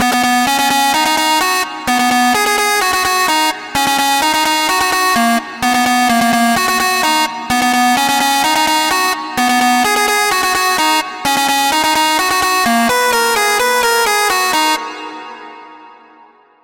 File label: Lead